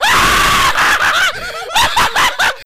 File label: Laugh, Worms speechbanks; Memes